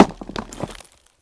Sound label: Hit Rock; Civilization4